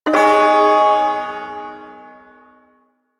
Ersatz Shawzin Yo Chord; Warframe; Duviri Ersatz Shawzin

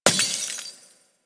Vial Glass Shatter; elements; Warframe; Warframes; Alchemist

